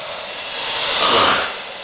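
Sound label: alligator; animal fun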